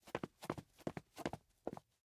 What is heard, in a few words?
Footsteps; LOOPERS; Tyler